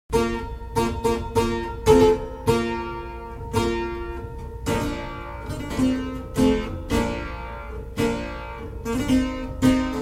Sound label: Clavichord; Praeterius